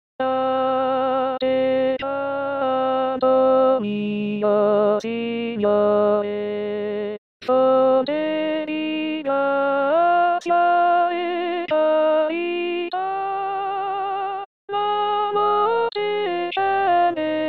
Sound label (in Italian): Contralti